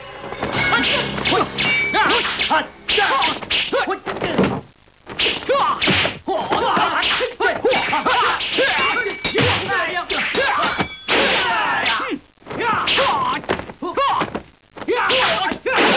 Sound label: Fight; 特酷声音素材库